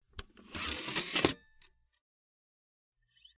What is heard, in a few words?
Cd Rom Door Open Tray, computers